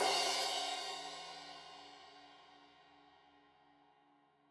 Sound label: Crash 15in L edge, Cymbals Stereo